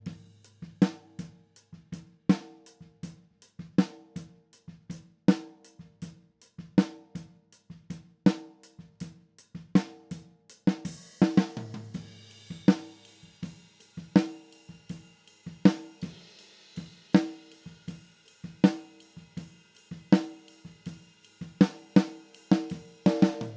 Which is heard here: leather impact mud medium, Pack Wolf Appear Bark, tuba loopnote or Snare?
Snare